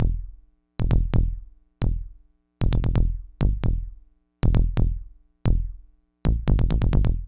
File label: Bass, Breakbeat